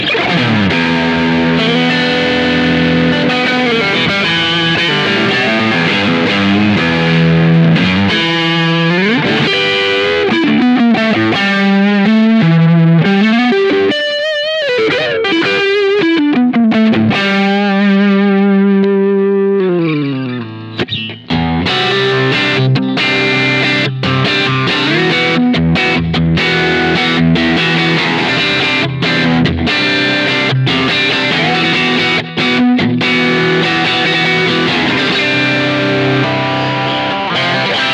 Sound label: Fender Lead II All Positions Drive